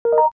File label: earphone on; mail; odoo